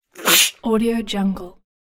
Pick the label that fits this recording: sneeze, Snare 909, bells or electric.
sneeze